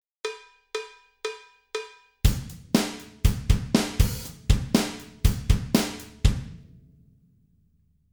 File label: drum